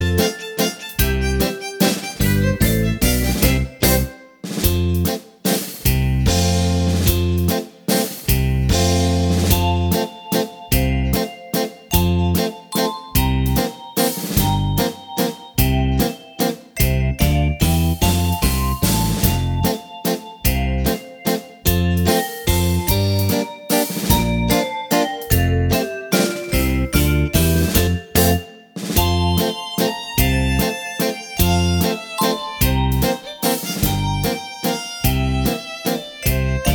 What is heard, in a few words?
mazurka